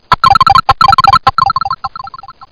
drop; Talking E-Mail

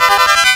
insert coin; MAME

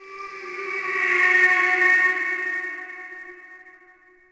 undead; DAoC

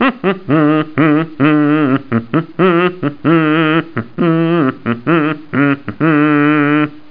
humming

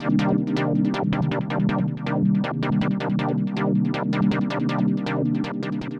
synth, ambient, 160, C; Keys; eJay

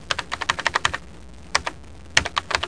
GOOD typing

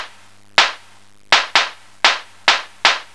clap; Enciclopedia Del Perro

